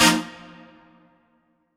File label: Dance Mega Synth; Best Service; 90 s Sample CDs